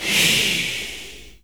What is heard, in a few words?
WHISPER EE; Spectrasonics; Heart of Africa 1; WHISPERS